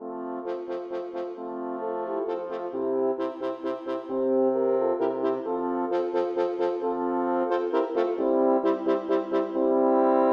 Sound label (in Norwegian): For fire horn